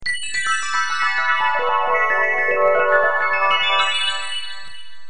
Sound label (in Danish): ringetone